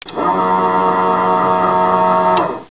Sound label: flaps; VERTIGO